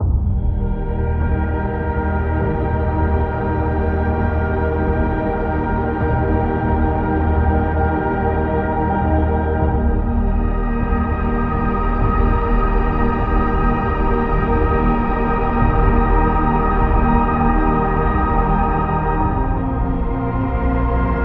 Zen, 528 Hz

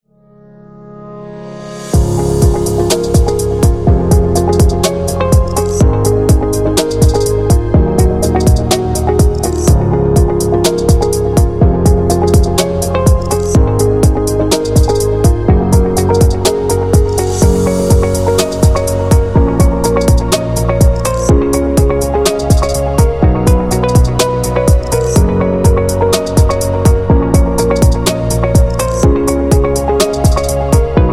Technology Corporate

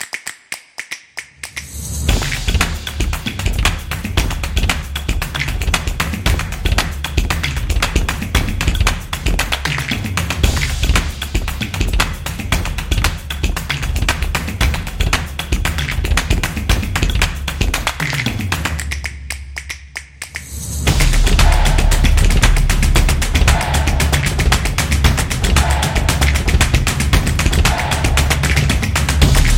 Drumming Opener

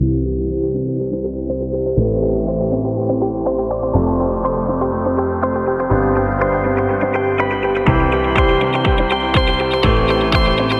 Upbeat Ad; business background

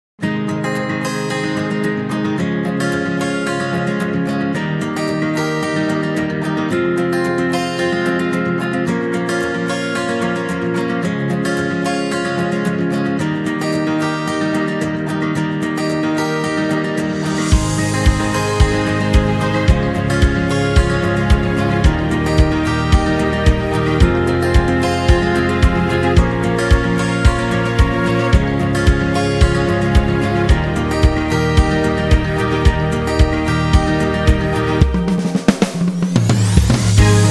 Acoustic Guitars; happy music vol 9

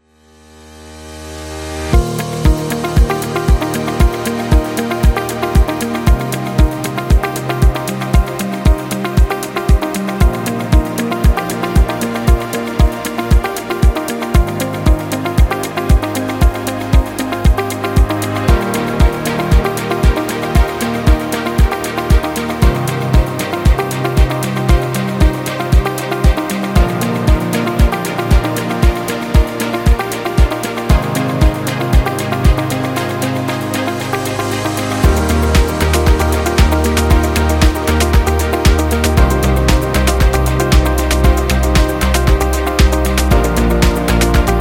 This Is Commercial; corporate success

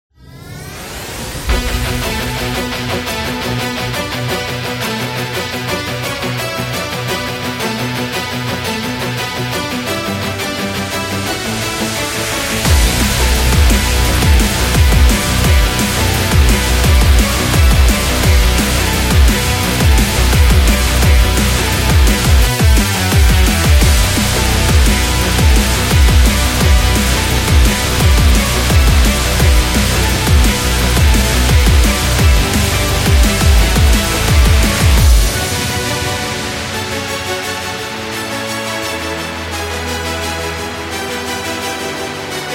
Epic Energetic; epic sport metal

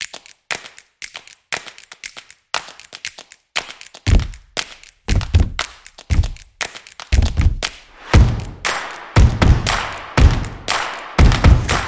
Drum Stomp

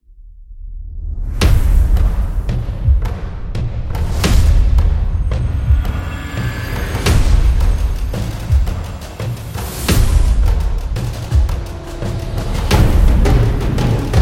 Epic Drums Cinematic

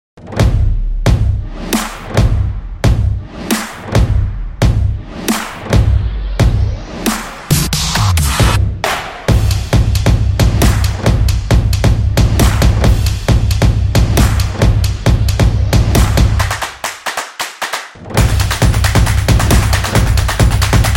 A Drumming Action

